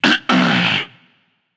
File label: clear throat; smite; olorun